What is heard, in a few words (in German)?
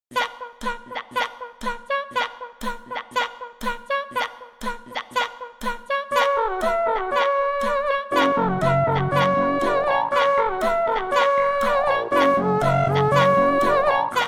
Klingelton; ZAP